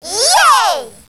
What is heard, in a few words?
yay; DS; ML BOWSERS INSIDE; Fawful